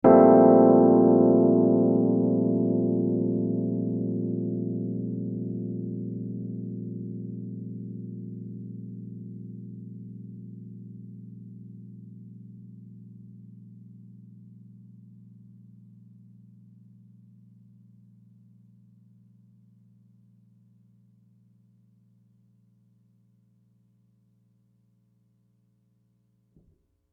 rhodes chord bb major 7 b5 poly c bb7

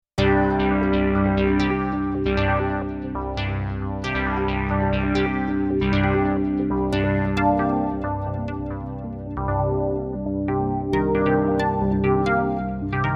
Arppegiated Polysynth Sans Subs

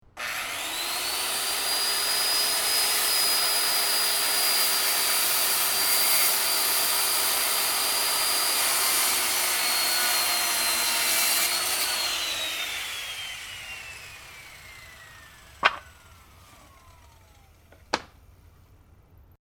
circular saw

